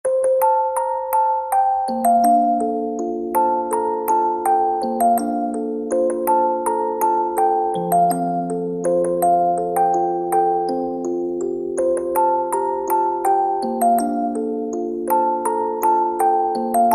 musicbox

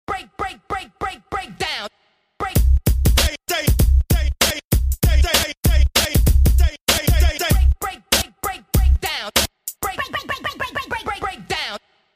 Aaaa

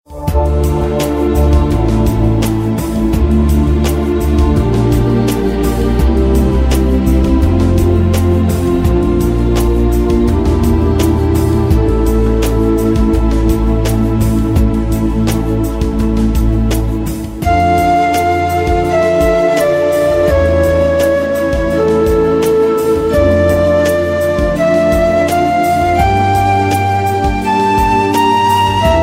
Sad Melody

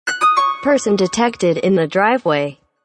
Person - Driveway

